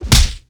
uppercut hit; Rust

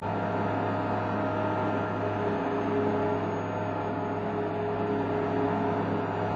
turbine rpm mid interior, tanks; War Thunder

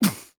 vce land

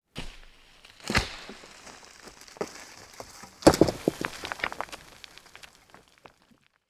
ambient; World of Tanks

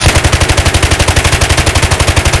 Automatic Utes, Weapons; DCS

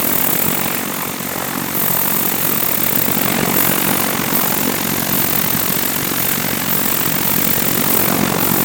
drone loop

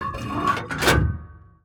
gun reload; World of Tanks

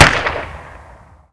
Inf Weapons; Red Orchestra 41-45

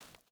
footstep barefoot stones jump start; Rust

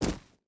footstep boots gravel jump land; Rust

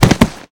bodyfall dirt; Call of Duty Modern Warfare

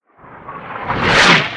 artillery incoming, Explosions; Call of Duty Modern Warfare

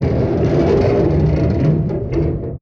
damage vehicle; World Of Warships

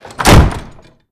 door slam; scn blackout peekdoor; Call of Duty Modern Warfare

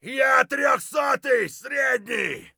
hurt medium; Escape from Tarkov

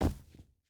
footstep hide concrete jump land; Rust

